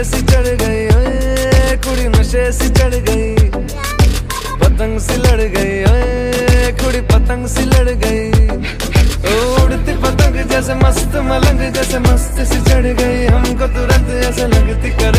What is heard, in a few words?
sweet bollywood ringtone